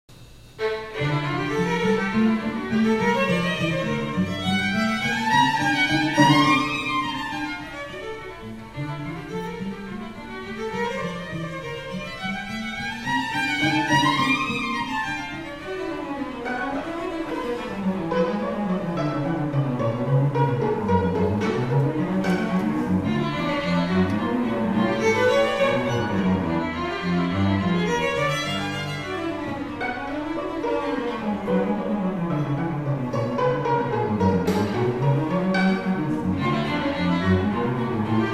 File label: courante